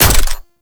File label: Mech; Warframe; Weapons; Zylok